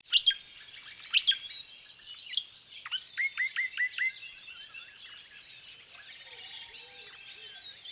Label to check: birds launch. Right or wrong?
Right